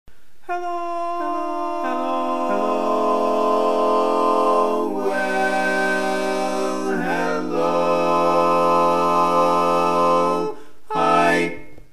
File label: Hello Bass, Chorus